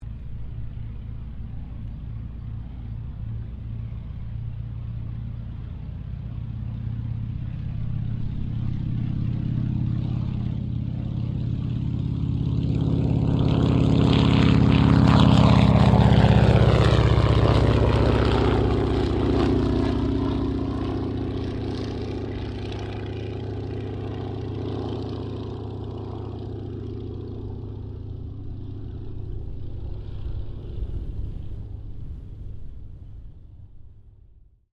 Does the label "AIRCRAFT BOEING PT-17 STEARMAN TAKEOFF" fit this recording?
Yes